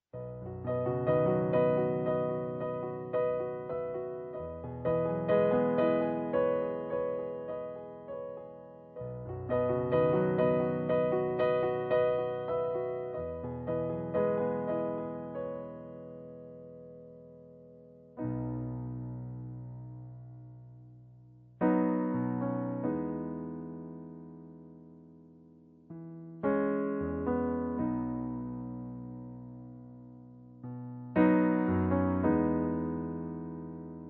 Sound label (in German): Playback, H-Dur